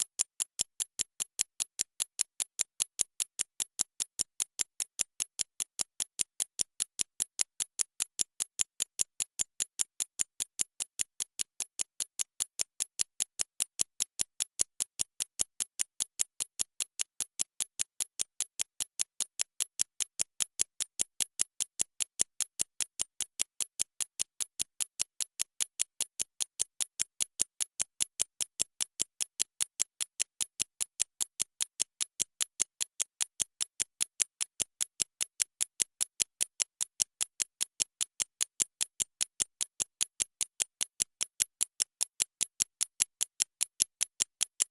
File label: clock tick antique pocket watch ticking; mono